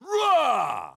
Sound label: Attack Extreme; Batman